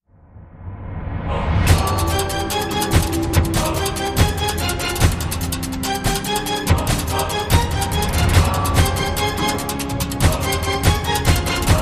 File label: Tension